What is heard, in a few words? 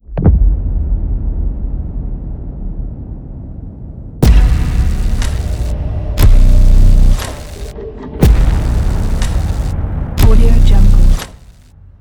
organic countdown hybrid trailer